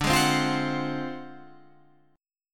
D 7th Sharp 9th Flat 5th D down, chord

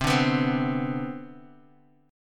C Major 7th Suspended 2nd, C, down, Bass Standard; CM7sus2 strummed; JGuitar

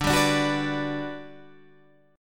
G Major 7th, D

